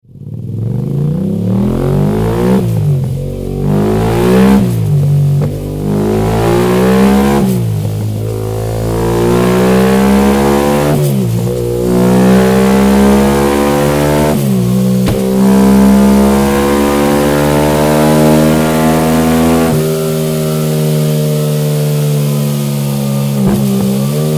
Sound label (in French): Ligne Complète MIVV SR-1 YAMAHA R7; Déclinaisons: Titane; Position : Latérale